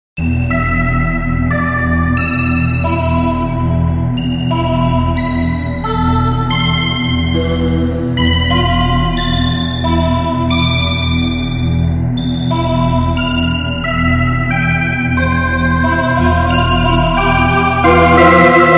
synthetic chorus

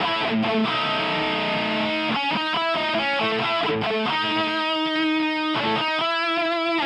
loop e 140bpm

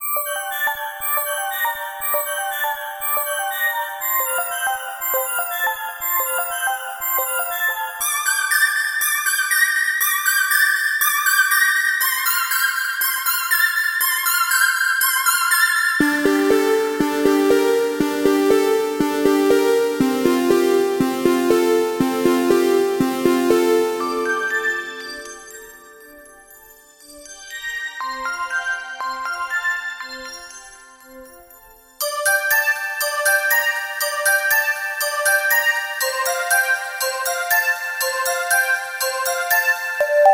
bells

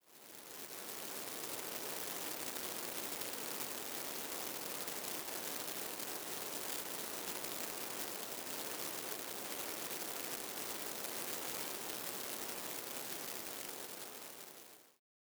light shower

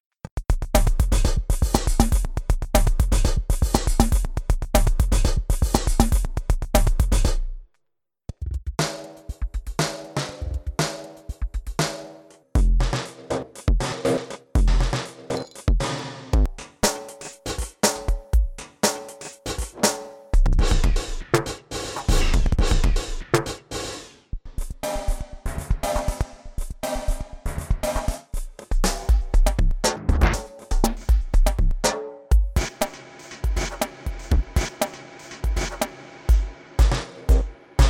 randomizing kits

